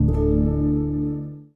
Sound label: Gong Harp; Sonic Foundry (Sony Creative Software) - Friendly Fire; Single Gongs